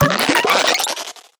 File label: Shrinking Sound